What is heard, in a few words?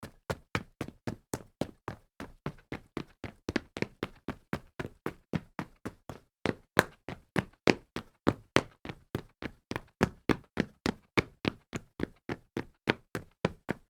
running on tile